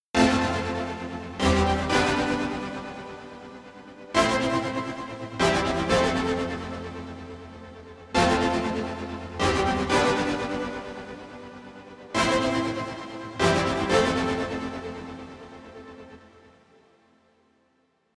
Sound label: lfo brass